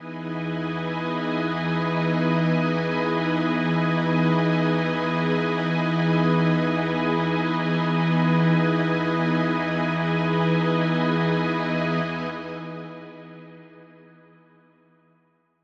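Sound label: Ambi String E major 7, synth orchestra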